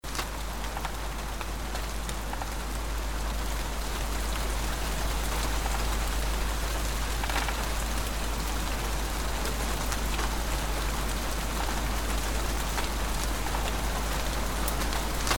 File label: Nature